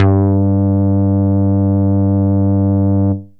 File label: synth bass